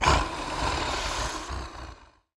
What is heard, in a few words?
creature snort, vocal elements